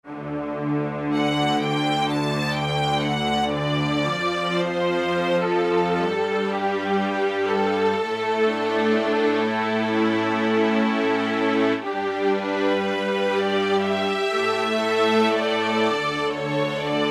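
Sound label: Strings Violins Symphonic; Roland L-CDX-04 Strings And Orchestral Percussion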